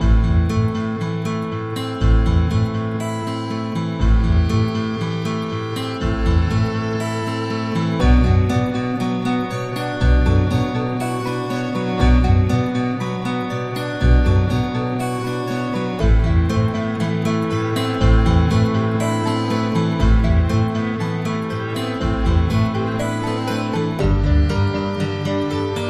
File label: Drifting Guitar & Mandolin